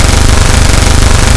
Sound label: fire; mw